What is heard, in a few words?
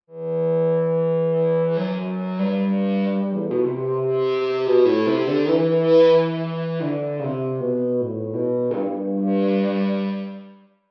cello ebow